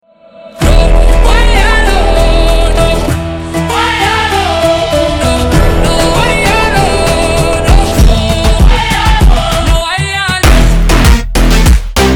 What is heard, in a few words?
Phonk Slowed